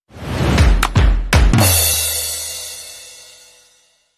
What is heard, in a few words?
short upbeat intro